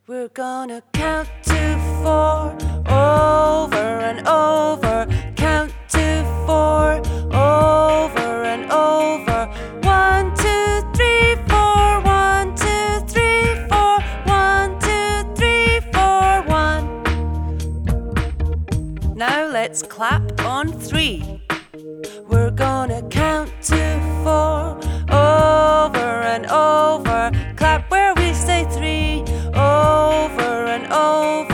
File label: Count to Four Soul